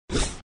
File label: Sfx Enemy Murloc Attack